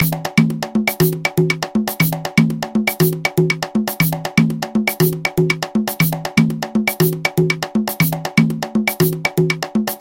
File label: guaguanco habana